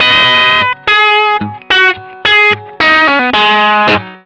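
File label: blue riff, Vintage Blues Guitar; USB Soundscan vol.22; AKAI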